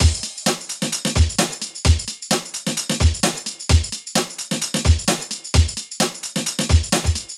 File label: Breaks, 4-Floor Breaks Kit